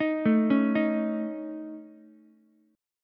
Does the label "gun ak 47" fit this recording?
No